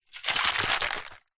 food drink cocktail shaker metal shake short ice liquid